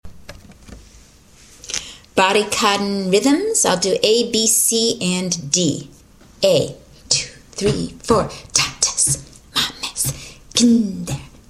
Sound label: rhythm parts; Rhythm vocal